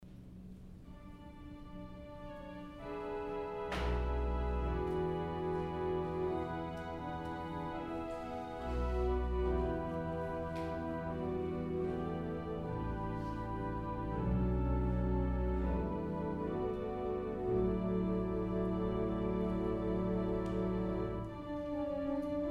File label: Jazz Chorale